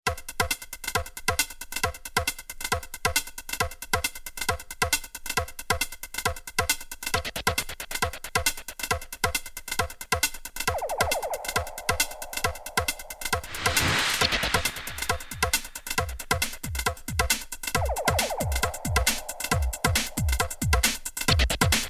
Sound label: Sfx beats - Serious sound